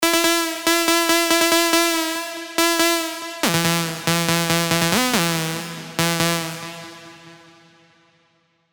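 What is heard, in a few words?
lead, Sylenth1; Technical Problem; alonso 19 year anniversary pack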